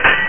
clang

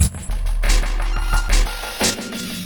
BACKBT, HipHop; LiveAct Pool

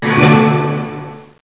orchestral, synths; Zoom - Release 2 (1996); Active Software

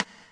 Sidstick

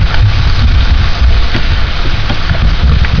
lava; KATANA; Daikatana

